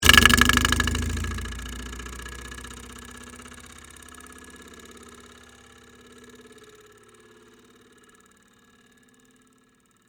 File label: Door Stopper Move, Texture, Door, Stopper, Movement; Miscellaneous Doors; BlastWave